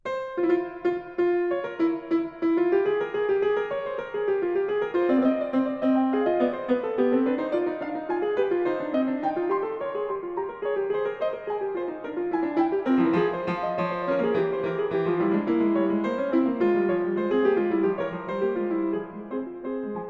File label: Fugue in f-moll, Keyboard; Bach; The Well-Tempered Clavier - Book 2; Fugue No.12; BWV 881